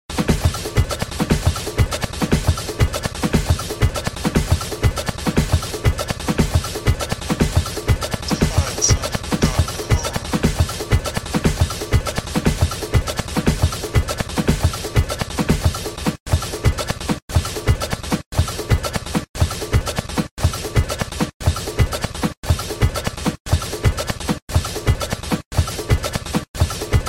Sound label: Deconstructed Trance Anthem